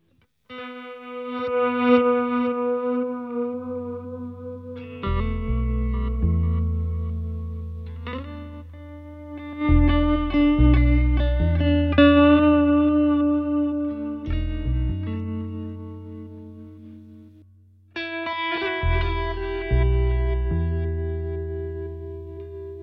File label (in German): Atmosphaerisch Pulp Groove luftig